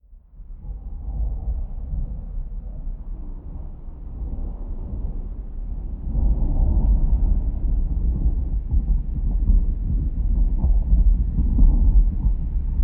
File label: Thunder Distant